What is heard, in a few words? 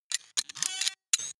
digital mechanical click, robot; comedy cartoon